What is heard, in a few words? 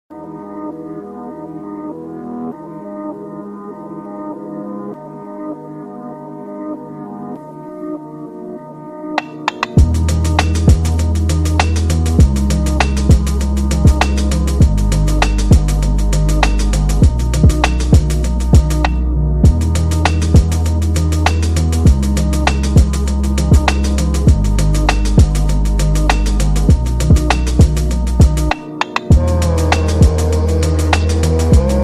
speed up